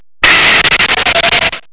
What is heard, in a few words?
Comic Brak; psion cd vol1